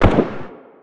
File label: m4a1 fire dist; midnight guns